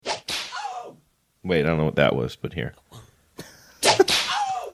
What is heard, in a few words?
sound of whip cracking saying wait i dont know what that was but here